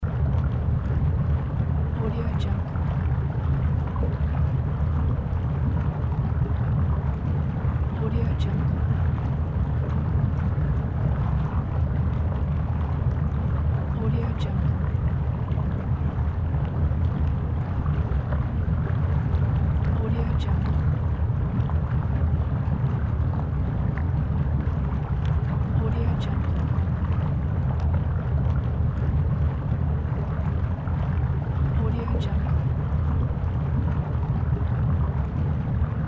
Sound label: volcano bubbling loop